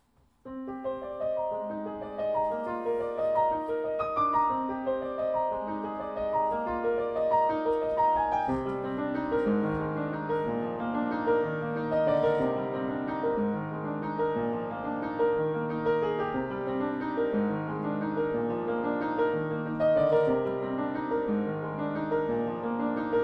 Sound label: After Tuning